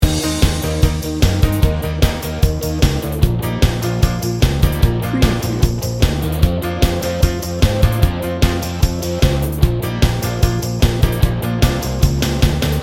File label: driving upbeat rock loop